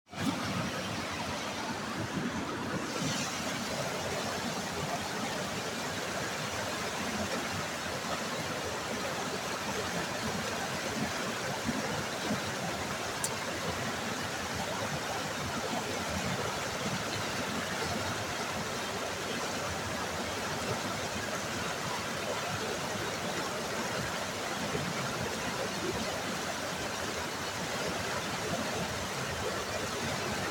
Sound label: falls sledding hill; berea